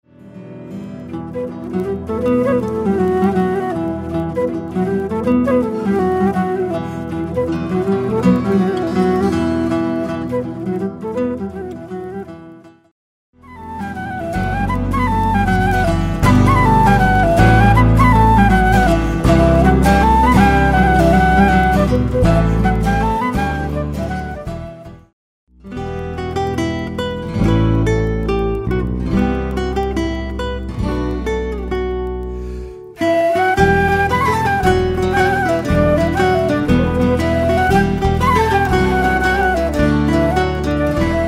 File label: gavottes